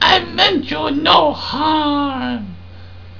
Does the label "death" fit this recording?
Yes